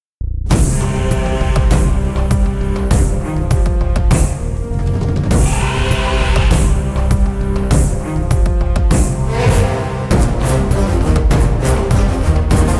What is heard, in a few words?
Elephant Scream Music Piece; Kannada